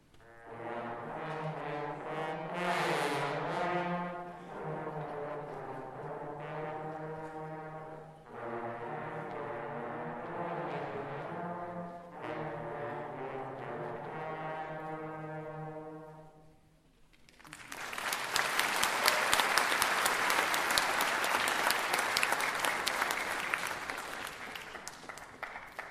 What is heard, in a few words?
trombone beginner feature winter concert